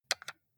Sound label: console controller xbox xyab press